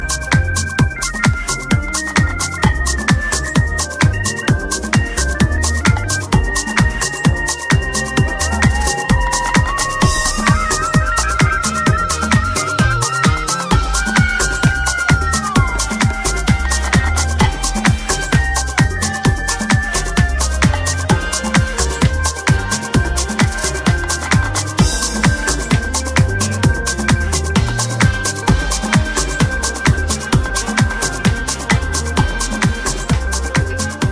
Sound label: Bass Inst